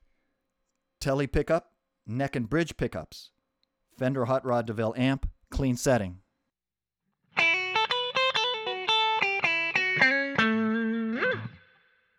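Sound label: tele neck bridge hot clean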